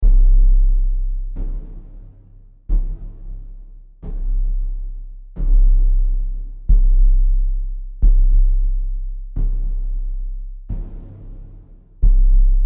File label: Death Drums, Eerie and haunting death march drums; Deep Tones; Sound Design